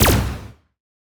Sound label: laser; spacemap; lasers